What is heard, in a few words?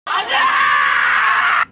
yelling